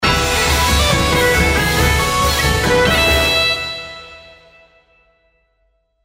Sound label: big win; Highland Reels